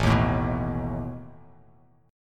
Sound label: A Suspended 2nd Flat 5th A down; Asus2b5 strummed